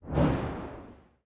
dash light; action; Alabaster Dawn

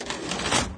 put away; There; ThereClient

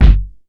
Kick; Ableton Live 12 Lite; Core Library; Drums

